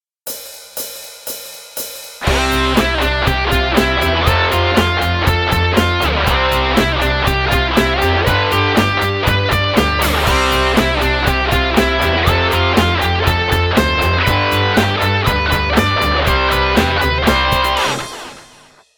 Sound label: Drum Kit; SIDrumKit; InYoFace